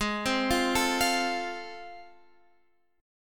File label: F Major Ab, guitar chord; arpeggiated; F/Ab